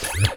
Single Shunt, DVD Drive External; DVD Drives

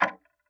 Tick, Clocks Kitchen Clock

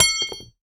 Aluminium Tube Drop

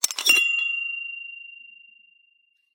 Hole Saw Rattle, Hole Saw Bits

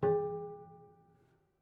Prepared Piano

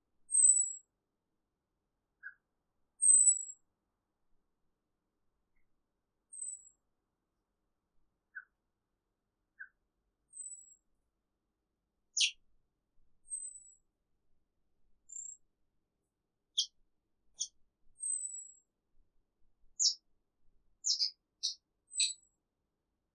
Exotic Cage Bird Isolated; Animals

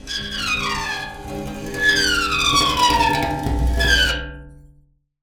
Pane Of Glass Rub

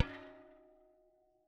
Bicycle Spoke

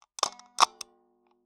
Taxi Meter

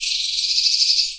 Birdsong Bit, Oxford Island Lough Neagh

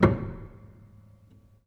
Hamm Perc, Piano Hammer Perc; Piano Miscellaneous

